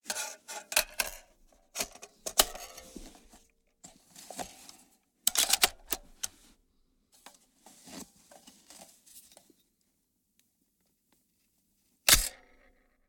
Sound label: int ar 15 m4 charging handle with mag